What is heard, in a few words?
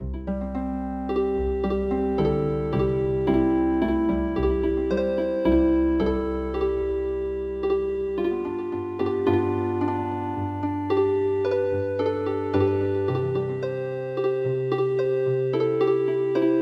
Healing Harp; The Babes In The Woods